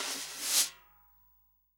SD, REAL DRUMS, SWING KIT

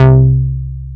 SH101; OLD ROLNDS

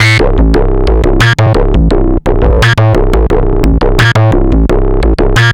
Synth, Drum n Bass

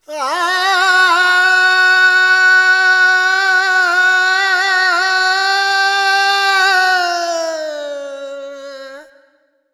Expression, Vocals Male World Pop

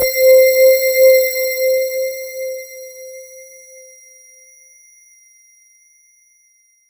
C4, Roland JV 2080, JUNGLE BRASS

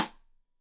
Side Stick Stereo; Deeply Sampled Drums

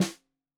Snare 65 Reg Stereo, Deeply Sampled Drums

